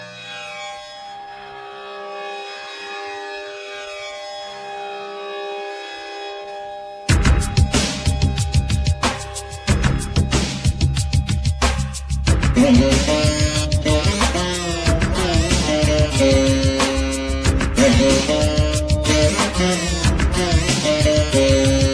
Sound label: Digital Classical